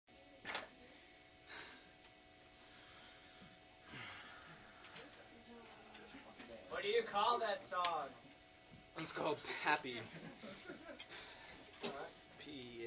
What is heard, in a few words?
crowd question; dekalb